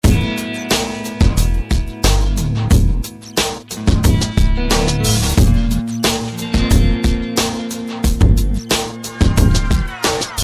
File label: Loop